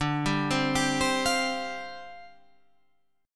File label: G 7th Suspended 4th D